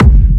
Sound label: Techno Kick; 30 Techno Kicks 2025; Ghosthack